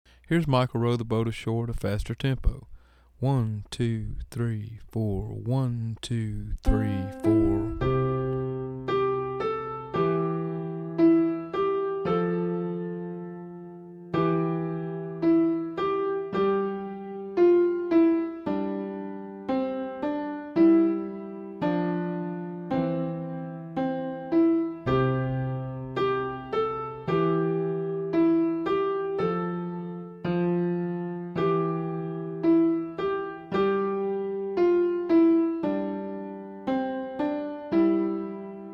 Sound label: Fast; Michael Row The Boat Ashore